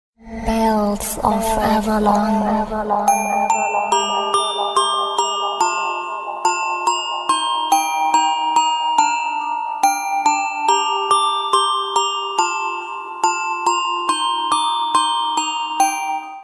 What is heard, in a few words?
Beautiful Bells